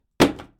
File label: Bang Box